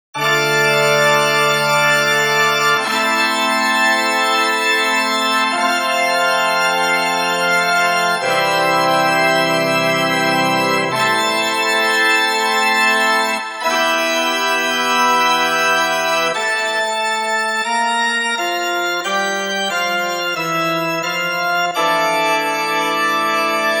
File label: Recorded Organ